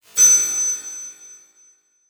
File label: Multimedia Internet CD-Rom Flash High Pitch